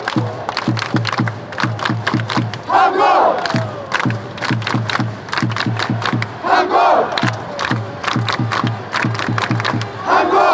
hangmo dup fanchants

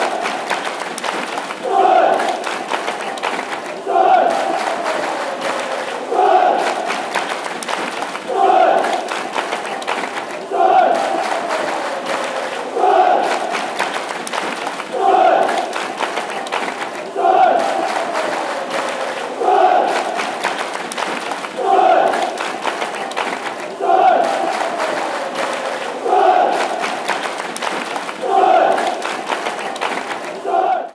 chants; sunderland

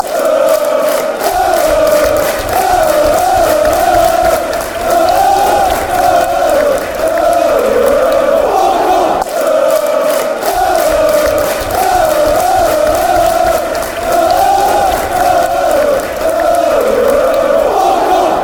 fan chants; swindon

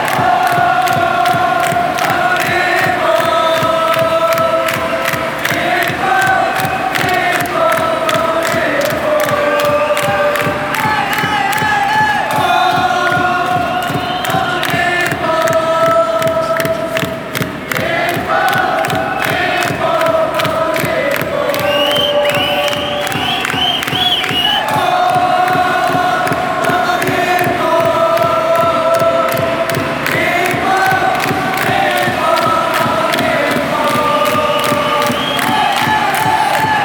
japanese chant

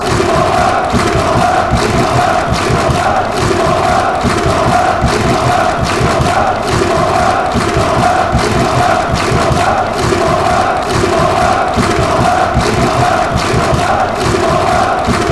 girondins girondins

